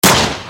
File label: pistol